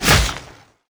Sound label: guardian lions hit loop end